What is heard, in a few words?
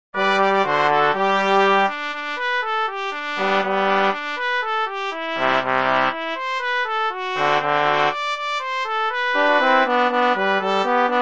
jingle